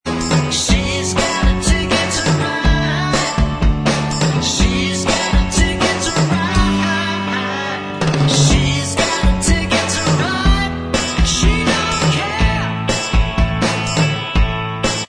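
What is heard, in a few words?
backing vocals track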